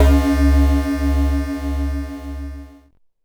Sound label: C#, BELL GONG, Synclav FM; Big Fish Audio